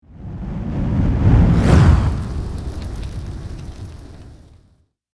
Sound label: Fire whoosh transition; cinematic